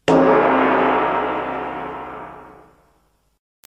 Metaal gong

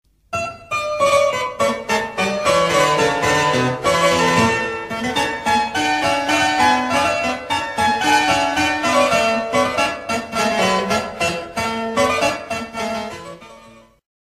klavecimbel; Piano of klavecimbel

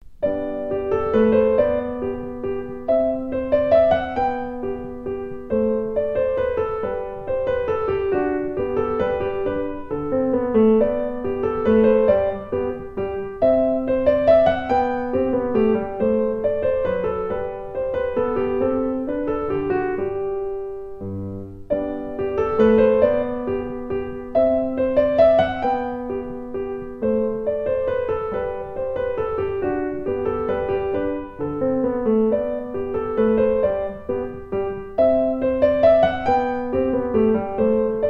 Pianovoorbeeld bach menuetje

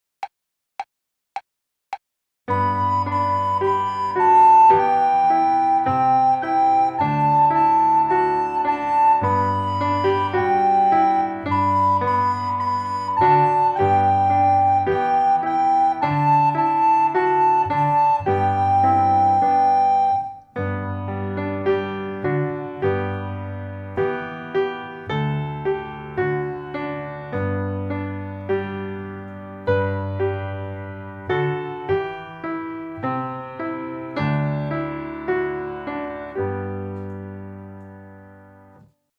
Begeleiding blokfluit

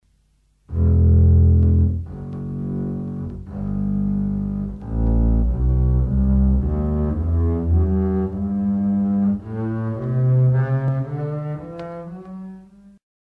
Contrabas laag hoog

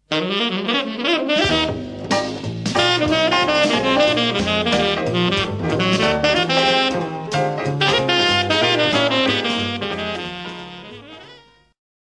Speelt de sax jazz rockroll pop jazz

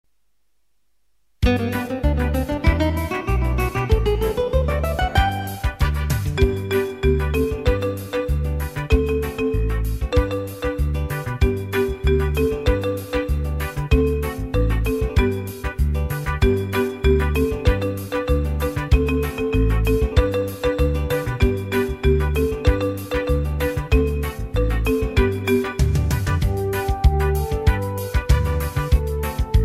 Begeleiding blokfluit

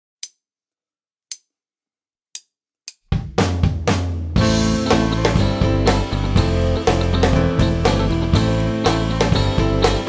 Improviseren met d a en c

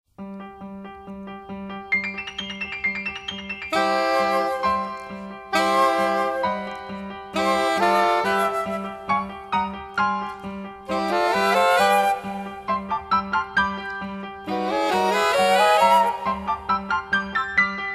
Do re mi solo

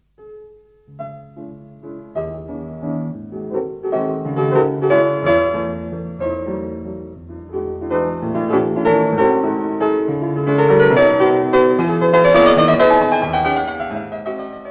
Pianovoorbeeld klassiek

